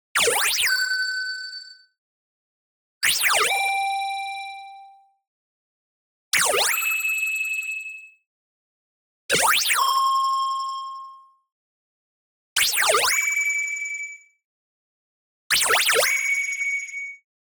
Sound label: ZAP Frequency Shing; boom library; Anime Essentials; DSGNMisc